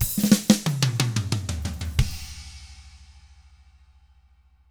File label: 122 SHUFLE, Double Platinum Drums, 122 SHUFFLED; ILIO